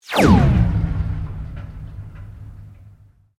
Sweep Mechanical Medium

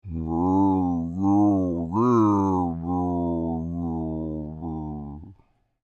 Creature, Human, Speak, Groan, Long; Groaning; Voice; Big Room Sound

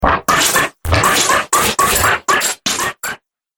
Science Fiction Laser Bits And Bytes

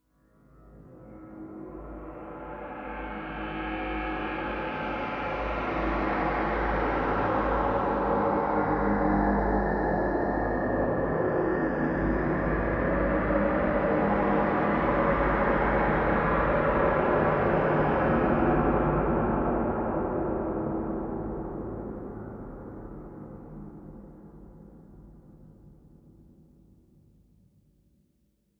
Horror Drone Foreboding Atmosphere; Scary Tones; Blastwave

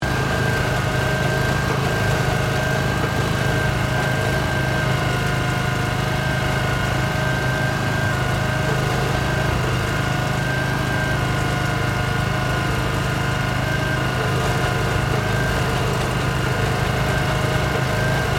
Asphalt Machine, Industry, Asphalt, Machine, Loop; Construction Sound Effects; BlastWave

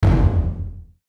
Hit Drum Low; Musical; Production Element Imaging Element Accent Transition; Blastwave

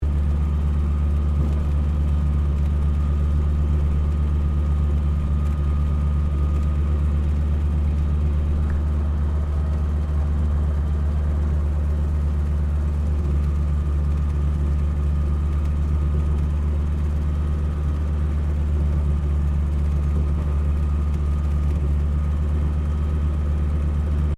AIRCRAFT AT 6D HARVARD MK III TAXI INTERIOR; Harvard Training Aricraft; Airplanes; Vehicles

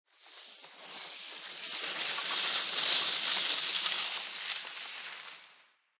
Skiing Snowboarding Coming To A Stop Distant; Skiing And Snowboarding

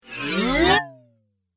Hi Lo Space Age Whoosh

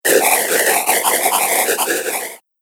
Amazing Air Sound, whoosh; Andrew Fleming

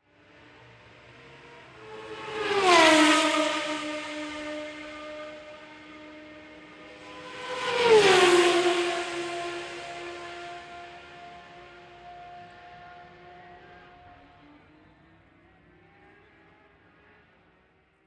formula 3 by, Formula Race Car, 3 Cars By; Two Formula Race Cars By , Fast; Vehicles

